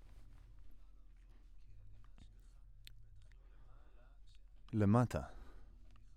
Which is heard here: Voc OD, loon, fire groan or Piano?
Voc OD